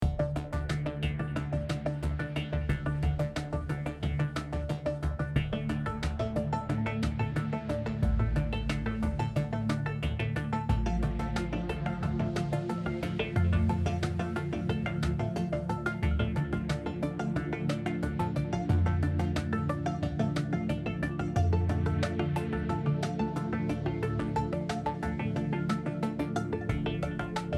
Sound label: Dialogue Normal; starpoint gemini warlords